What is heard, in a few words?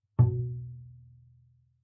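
Bass pizzicato fortissimo sul A B2, Double Bass; MIS Pitches - 2014; Strings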